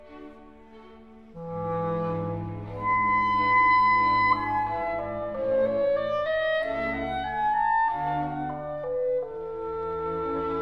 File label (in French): Clarinette Extrait Classique